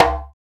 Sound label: LAMB, African Perc; Spectrasonics; Supreme Beats; African Contemporary; Afro DRMs